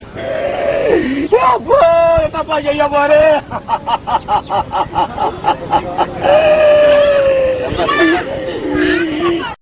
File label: wail